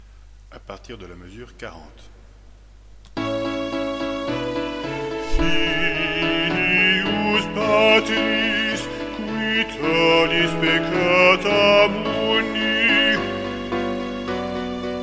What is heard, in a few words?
Gloria Solo; Schubert; Messe Sol